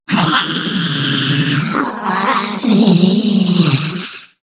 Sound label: low health; scarlet; slender